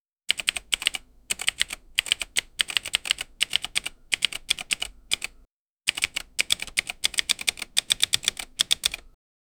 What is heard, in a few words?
button typewriter fast